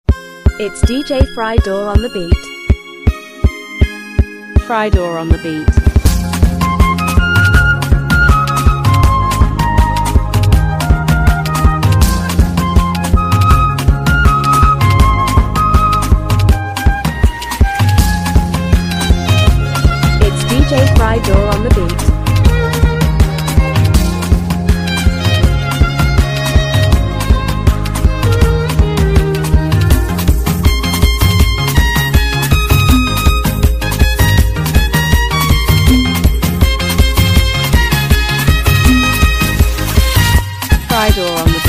Mara Beat

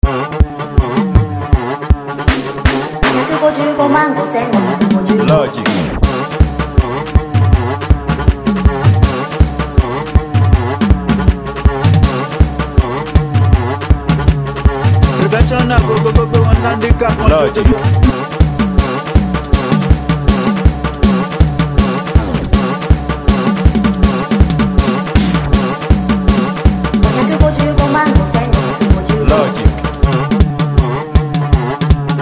Beat

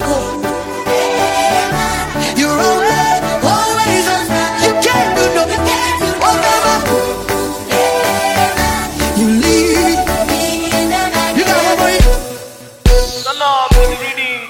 Dance Cruise

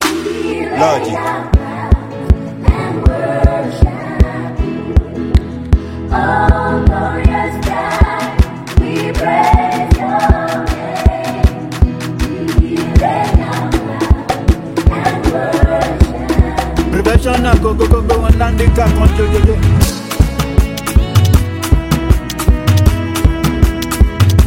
Professional Beat Worship; Mara

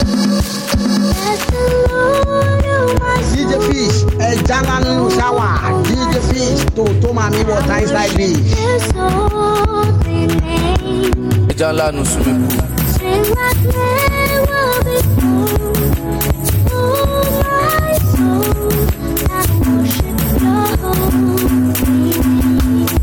Afro Mara

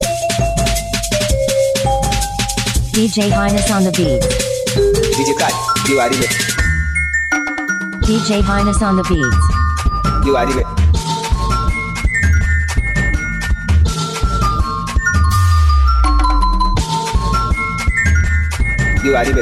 Spectre Mara Beat

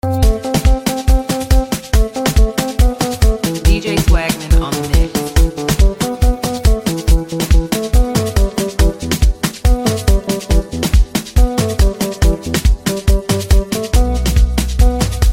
Piano Log

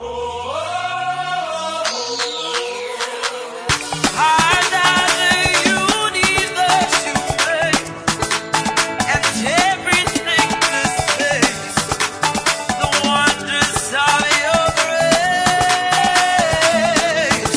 Dance Cruise

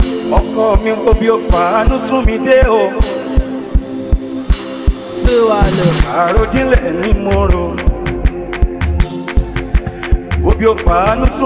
Arojinle Mara Beat

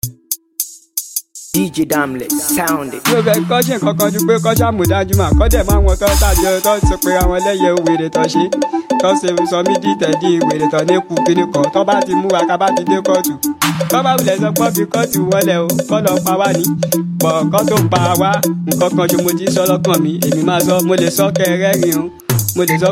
Danjuma Dance Beat

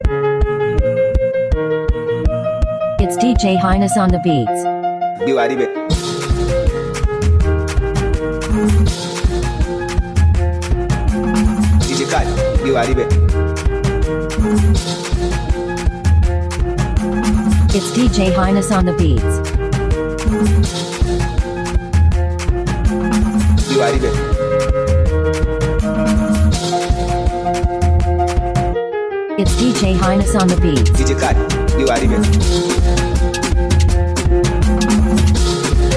Coffin Mara Dance Beat

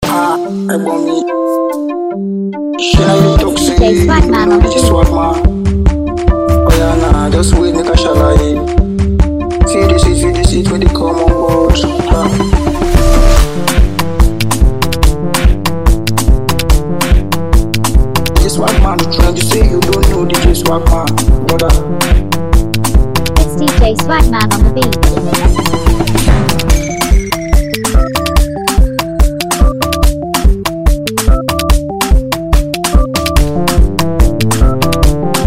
Swag Dance Beat

